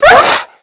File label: dog pain